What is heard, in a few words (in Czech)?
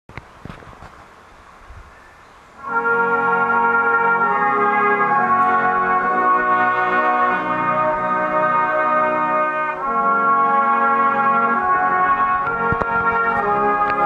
hudba; Dablice